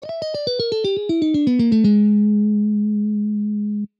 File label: Lick